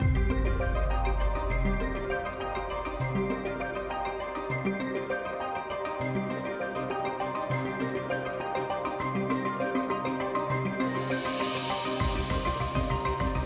Event Suspense Light

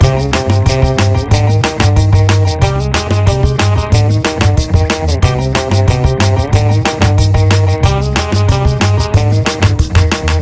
Rush Hour Loop; Rush Hour 90s To 60s Loop; Burnout CRASH!

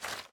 Footstep Paper-Sheets

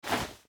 Jump

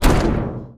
Mines Thumper Impact

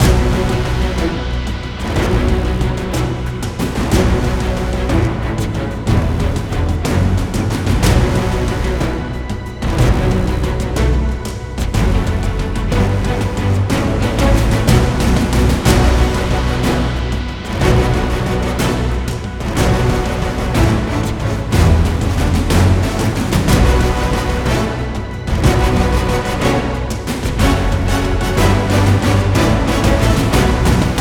Sea Combat Jungle Night

